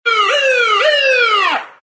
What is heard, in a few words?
siren pull over